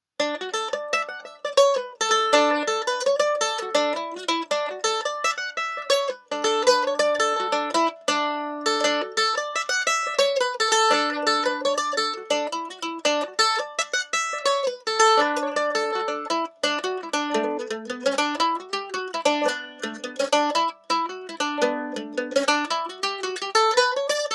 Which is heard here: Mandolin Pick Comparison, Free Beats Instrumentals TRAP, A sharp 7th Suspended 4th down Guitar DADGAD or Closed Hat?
Mandolin Pick Comparison